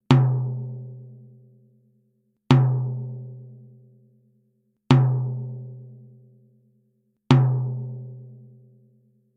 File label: tom clear medium